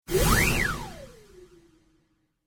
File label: Whistle Whoosh